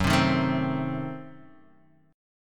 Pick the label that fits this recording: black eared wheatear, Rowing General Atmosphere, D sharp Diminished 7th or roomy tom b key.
D sharp Diminished 7th